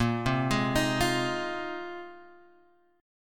Bb 7th Suspended 2nd Suspended 4th, Guitar, Open F; arpeggiated; 10,7,8,10,8,x; Bb7sus2sus4